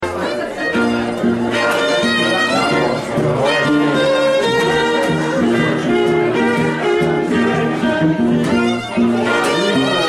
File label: strings